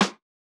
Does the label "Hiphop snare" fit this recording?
Yes